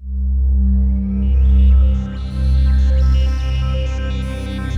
WS NL C 2, Korg Wavestation; Back In Time Records; Korg Universe VOL-1

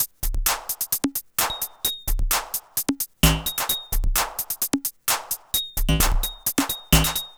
Percussive

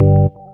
Keys; Houseworx

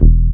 DR. LOOP, BASS; X-Static Goldmine 3

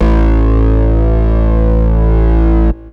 dance, bass

